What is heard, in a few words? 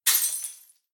glass shatter